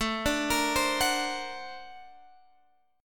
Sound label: A sharp Augmented Major 9th